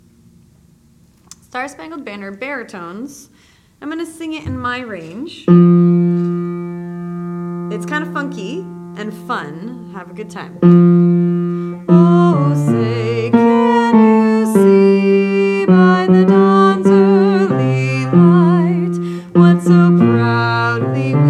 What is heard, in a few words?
Bass SSB with voice